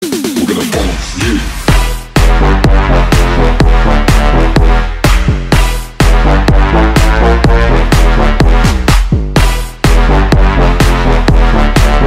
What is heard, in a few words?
Funk Remix